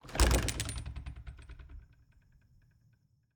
Cabin, front door, split door, oak, large, iron fittings, open, hyper; Eiravaein Works; Vaeyan IV